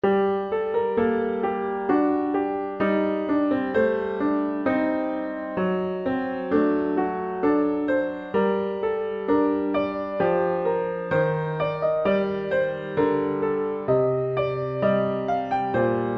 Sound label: Bach Menuet Sol Minor; ilk bach albumu